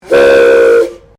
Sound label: Train Steam Engine Whistle; Bellarine Tourist railway vehicles